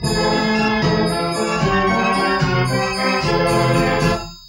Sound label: taunt; keeper